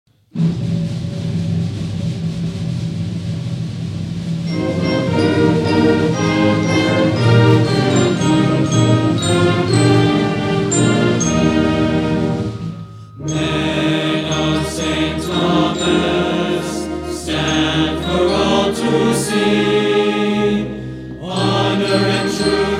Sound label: Men with Choir; Men of St Thomas